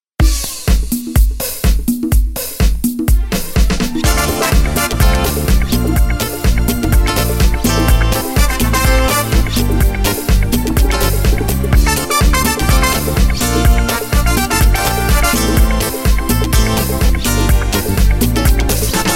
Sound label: Korg Pa3X 70 disco